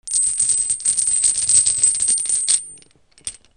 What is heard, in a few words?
BANGS